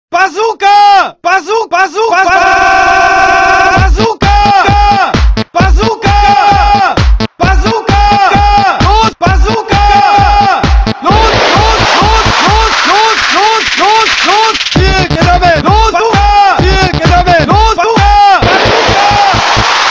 bazooka song; dod

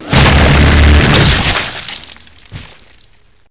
pwrench big hitbod, chainsaw

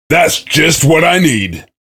cheer; svencoop; voice commands; SeriousSam

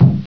move attack second step; ourano

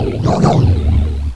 pit drone communicate; cryocell

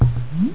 drip

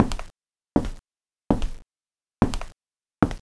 steps; beerdog

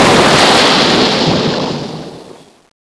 poison spit; boss Angra

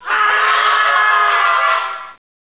scream, scientist

